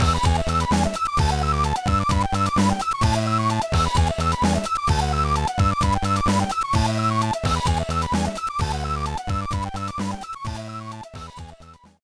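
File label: background music; No No No Puzzle Chailien; GBA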